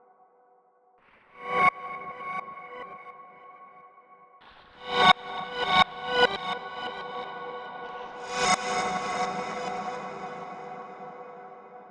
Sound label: Bpm140 Chord Loop Gmaj; Airy; Raining; Prime Loops; Dubstep Illusions